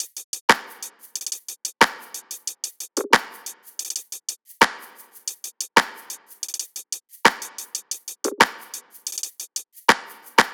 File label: top loop 91; Pop; Drums; Loops; Slate; SD PASADENA Pop Sample Pack